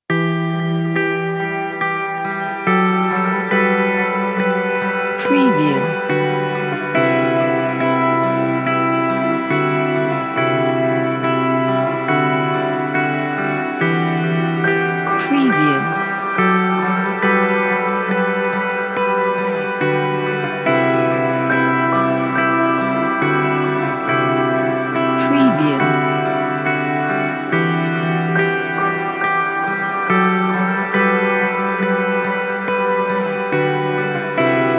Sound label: guitar ambient